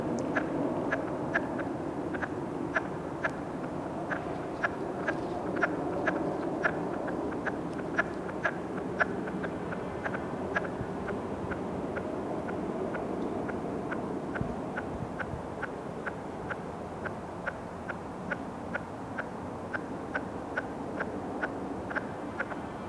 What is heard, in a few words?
Call